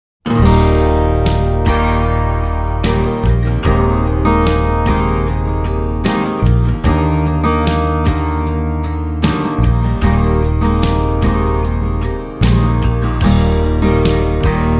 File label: Kicked Back; Soundzabound Volume 4